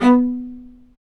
Low Marcato; String Master Series; Combos 1

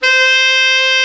TENOR, Tenor Sax; Tenor Sax 1

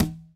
LOW TUMBA, Conga Battery; E-MU Formula 4000 Series Vol. 4; Earth Tones

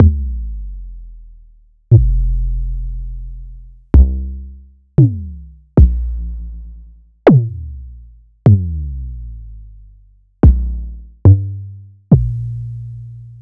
ms20 raw toms

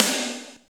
SNR, Tekno Industrial; East West

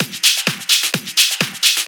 Discohouse Loop 128 BPM

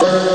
chor; Amiga Soundtracker Sample Packs

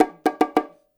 FILL, Percussion; InVision Interactive; Percussion Slam; SULTAN

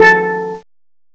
STEEL DR, MALLETS; M8; CMI Factory

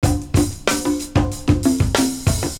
Percussion; AMG - Coldcut's Kleptomania VOL-1

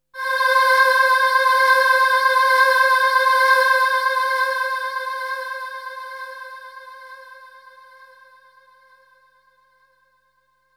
Melody oneshot; ZERO G - Datafile DISC 2

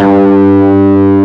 G-1 6 OVER D, GUITAR; AKAI S-Series CD-ROM Sound Library VOL-8

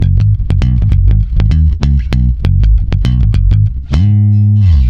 G#, swing mute, bass; Spectrasonics; Bass Legends

